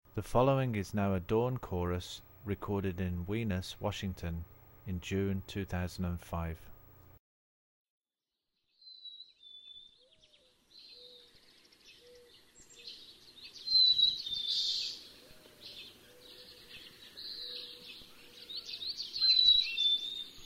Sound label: Chorus, Songs of Birds of North America